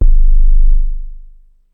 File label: Rap Boom Kick, Ensoniq ASR-X Pro